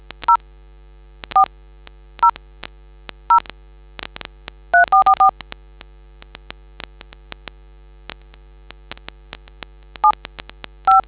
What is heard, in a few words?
dtmf